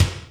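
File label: Ambo kick, Boss DR660; drum machine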